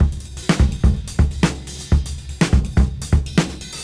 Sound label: Disk 125 BPM; AMG - Keith LeBlanc Phat Butt Jams